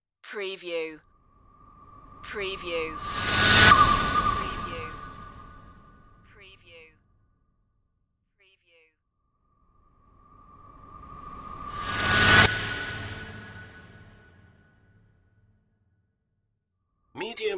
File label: scary whooshes